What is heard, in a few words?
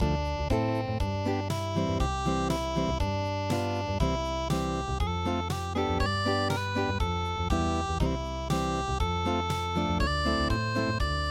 kimigayo unpluged